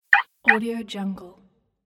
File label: cartoon car lock